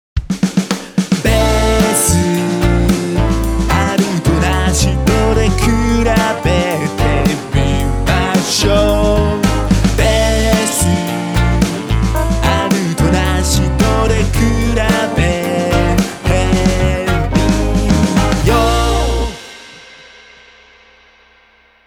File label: bass